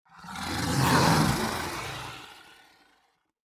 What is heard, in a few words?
feral zombie; Stellaris; Necroid portrait